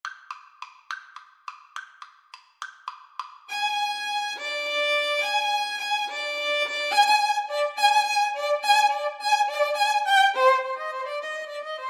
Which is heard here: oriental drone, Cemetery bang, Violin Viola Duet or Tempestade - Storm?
Violin Viola Duet